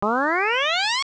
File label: G2 rise